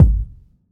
deep kick one shot G sharp key, kick drum one shot tuned to the G# note